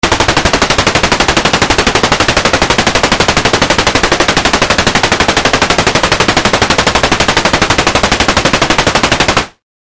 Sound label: helicopter